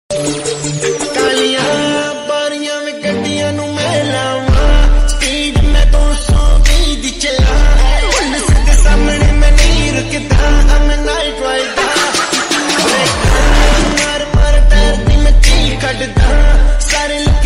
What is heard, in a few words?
ringtone 8d version song ringtone bass booster ringtone